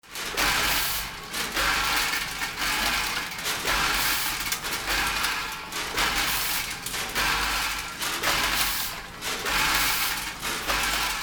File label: candies filling machine